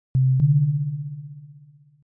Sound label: mode activated